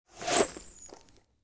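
Whoosh Bow Arrow Shoot, Magical, Surreal, Science Fiction; Electa Quinney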